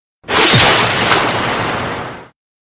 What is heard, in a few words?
water slash